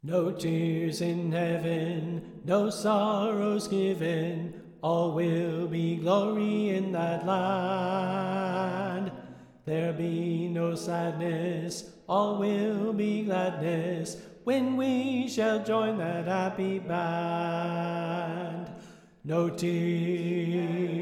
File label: Soprano Left